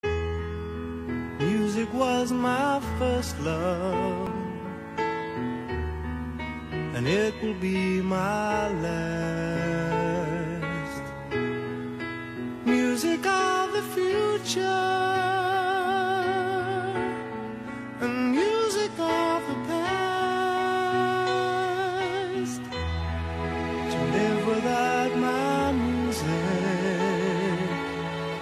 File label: music